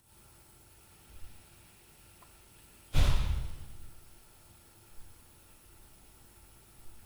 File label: DOOR, Night Noise